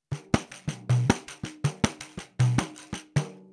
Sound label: Surdo